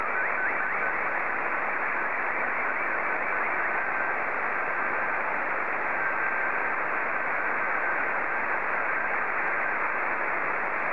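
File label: Martin 2, slow-scan television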